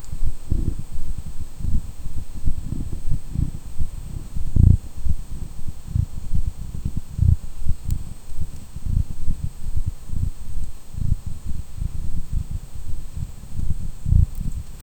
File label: an unnatural silence take